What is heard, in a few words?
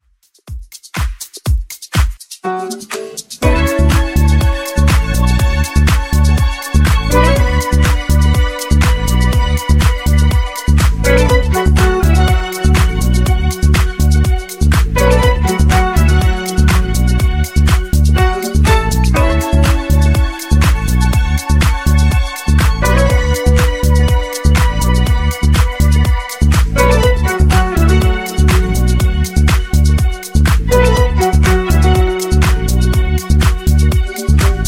Funk Nu Disco, 122Bpm